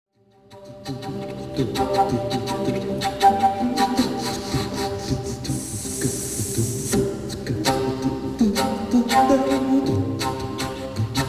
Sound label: Flute whisper